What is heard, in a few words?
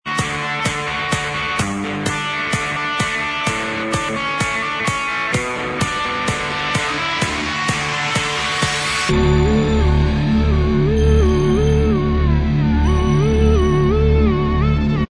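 Inst Track, instrumental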